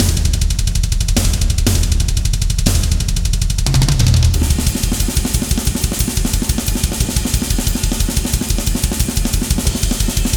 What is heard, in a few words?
only drums